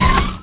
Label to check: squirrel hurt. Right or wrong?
Wrong